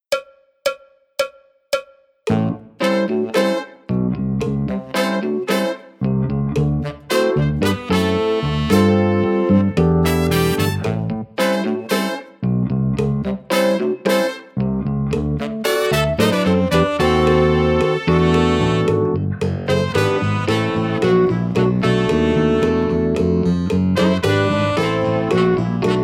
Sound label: backing track without click